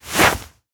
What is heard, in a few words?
clothing, Objects; haptics; com.meta.xr.sdk.haptics; HapticPacks